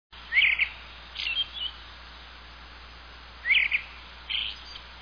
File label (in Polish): drozd oliwkowy - Turdus obscurus; występuje w tajdze północno-wschodniej Azji; wroblowe; turdidae